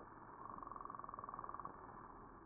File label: cusk-eel